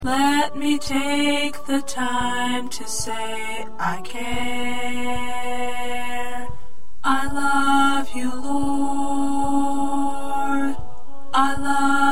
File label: 2nd Tenor